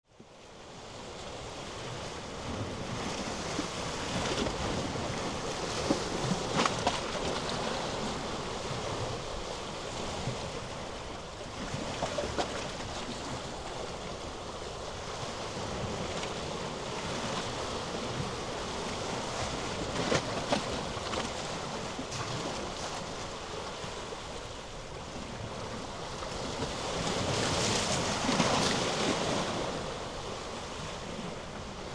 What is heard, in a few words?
Breakwater Small Gurgling Waves; Imperia; San Maurizio